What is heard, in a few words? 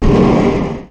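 Collision Interior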